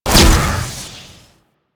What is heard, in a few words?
Turret Drone Launch; Warframe; Venus; Venus Turrets; Venus Auto Agent Drone Turret